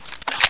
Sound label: uzi reload; weapons; valve